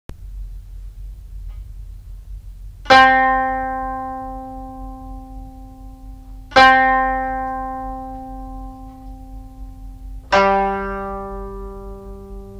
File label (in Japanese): 箏 調弦; 本手; C G G# C D D# G G# C D D# G A; 本手は壱越の雲井調子にして一を四と同音に下げる